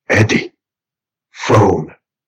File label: phone